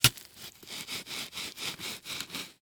Sports Stake Wood Stake Stabbing And Twisting In Dirt